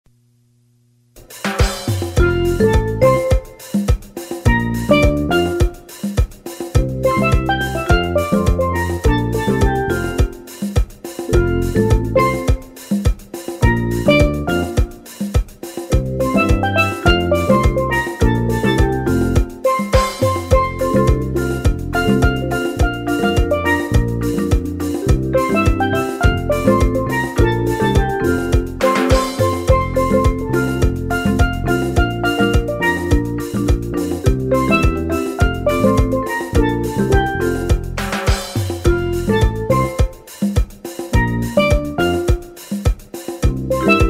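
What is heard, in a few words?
Calypso with steel drum; Joe Mixon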